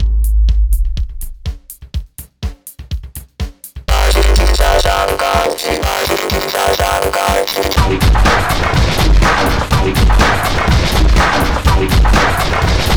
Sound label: Bossa; Steel Strider